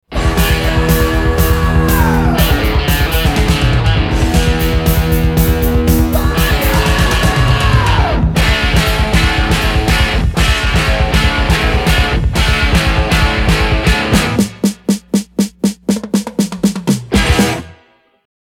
drum

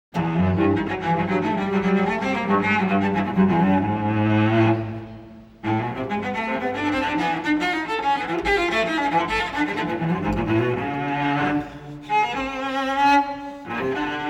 suite for cello solo scherzo allegro molto; benjamin britten; no 2 op 80

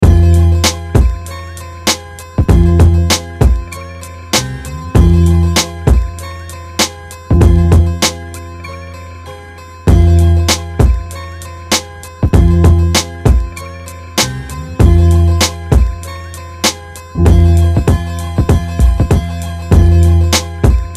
instrumental

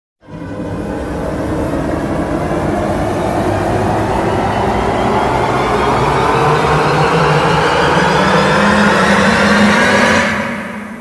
sea of tremelos

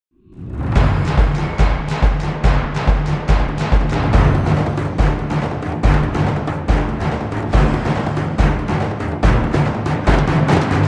speed build no vox

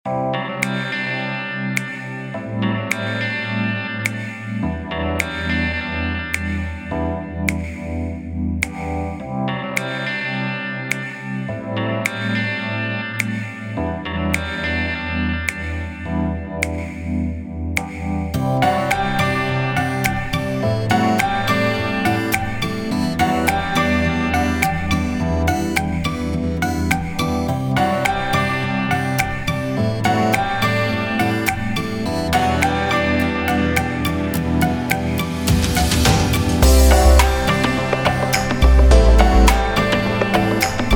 acoustic instrumental

